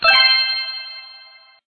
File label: tap to play; stack jump